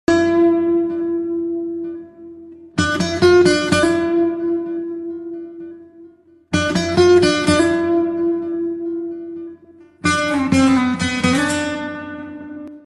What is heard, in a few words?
Slowed Reverb Ringtone